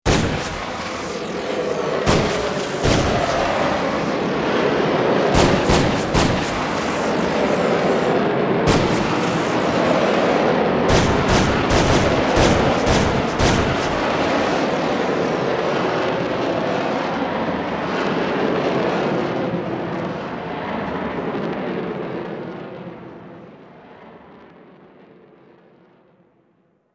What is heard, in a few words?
Bullet Time; FXHome; Bullet and Gun